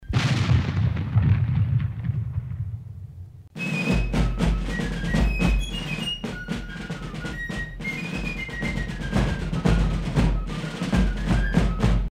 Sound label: hornpipe